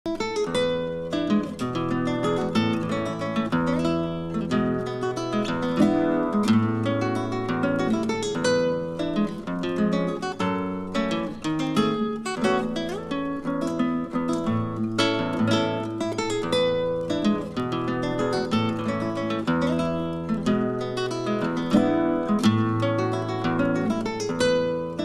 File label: Venezuelan Pop & Folk Nostalgia